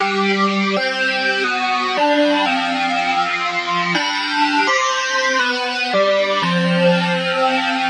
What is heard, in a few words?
80s synth melodyline, synth and electronic loops